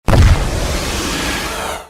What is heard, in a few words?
omnitrix transform; lonekat takumi